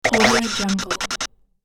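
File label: sci-fi robo glitch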